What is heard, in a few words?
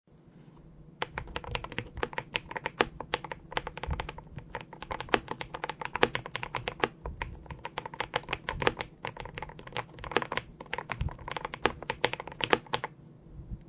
epomaker th108 pro sea salt sound